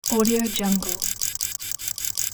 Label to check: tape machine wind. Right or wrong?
Right